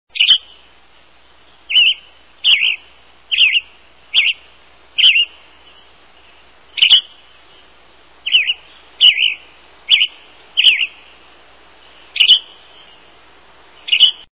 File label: Western Tanager